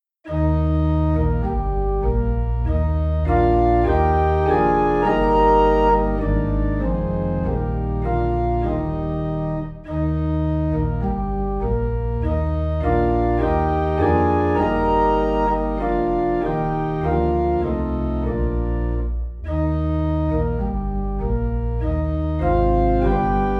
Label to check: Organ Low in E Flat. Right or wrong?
Right